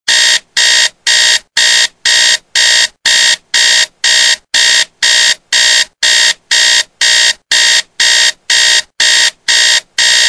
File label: Matrix Clock Alarm; Wecktöne